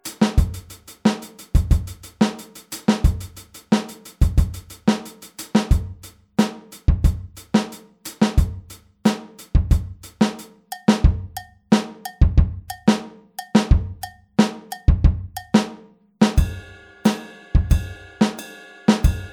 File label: Groove all